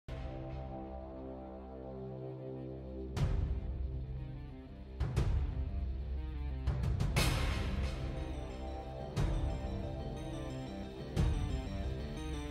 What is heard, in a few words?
Battle Dialogue Theme; Ryo VS Geese Fateful Battle